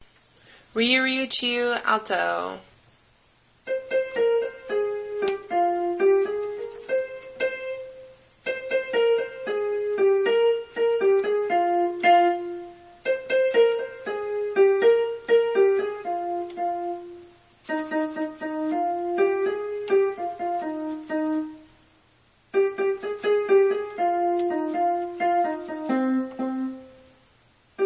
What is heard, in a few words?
alto